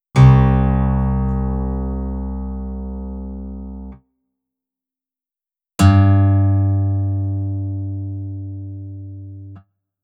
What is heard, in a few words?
Open C, guitar